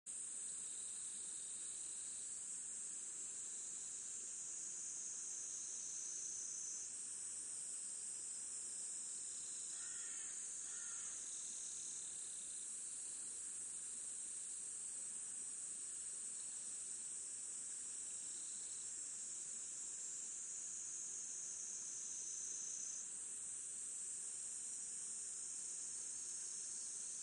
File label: riverside